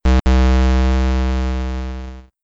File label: Windows Command 5.0 Startup; Windows Never Released Soundpack 2